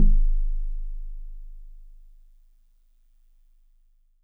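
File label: Celeste DX100 G#1; Pristine Celeste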